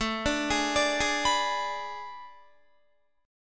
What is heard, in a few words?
A Major 7th Suspended 2nd Suspended 4th, Guitar; AM7sus2sus4; arpeggiated